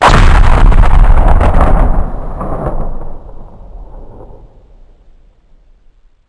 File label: explode slow